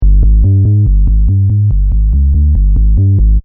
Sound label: Techno-Trance Sub Bass Loop In G 142 BPM; Bass Loops